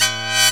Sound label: BAND, Hip Hop; E-MU Formula 4000 Series Vol. 1 - Hip Hop Nation; Wicked Hits